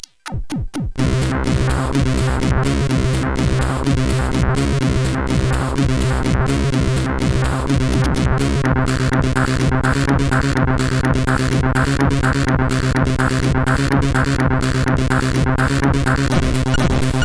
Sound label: Techno Strange, CSG8580R5; Techno 2